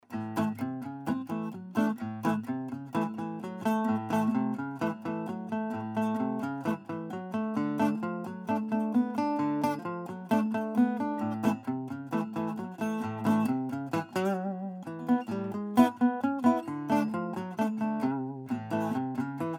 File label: shuffle